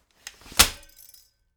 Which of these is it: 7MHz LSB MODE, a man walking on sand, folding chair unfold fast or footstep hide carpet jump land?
folding chair unfold fast